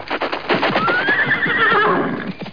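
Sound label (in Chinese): ma jiaosheng, horse neighing; jiachu jiaosheng; jiaqing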